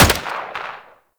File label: Rolling Drone Turret Fire; Warframe; Grineer